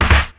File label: Snare, Ensoniq ASR-X Pro